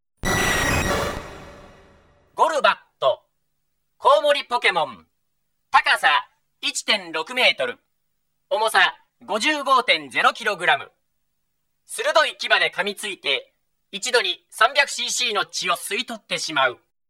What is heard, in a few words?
golbat, Pokemon Gameboy Sound; Pokemon Gameboy Sound Collection